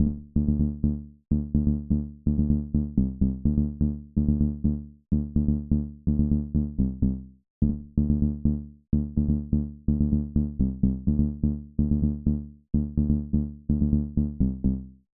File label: punchy tech house bass rhythm edm d 126; loops